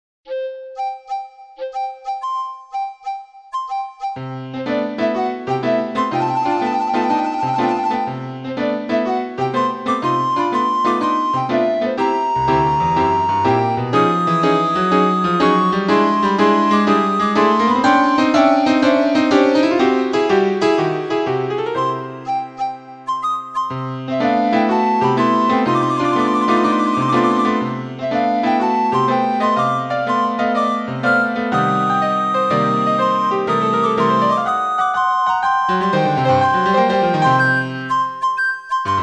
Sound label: recorder suite; 5th mvt